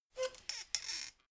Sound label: folding chair squeak